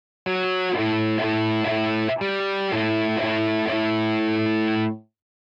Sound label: riff lesson